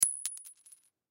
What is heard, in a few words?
Coin Drop Canadian Penny Cement; Money; Foley; Blastwave